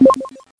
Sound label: click music